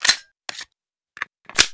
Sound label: reload round chambered, weapons, glock; Zshiryu777